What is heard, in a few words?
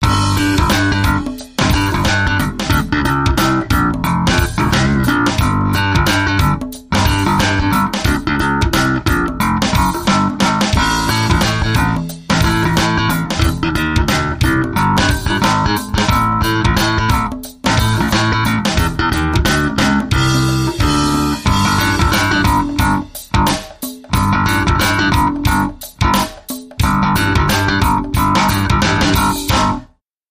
Bouncing Bass